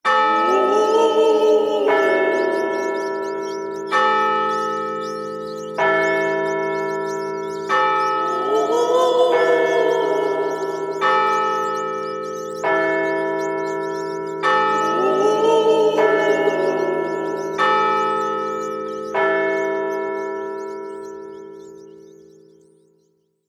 Scary Spirits; Seasonal Celebrations

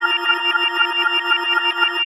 ringtones; HTC One M9; Spearmint

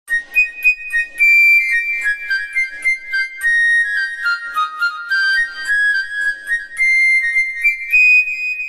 Flute

